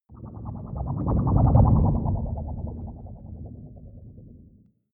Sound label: fly by wobble ship